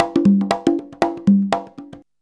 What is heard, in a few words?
CONGA 119